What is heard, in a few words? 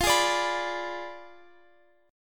F Diminished 7th F down Guitar Open F; strummed